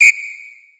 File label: whistle, electronic instrument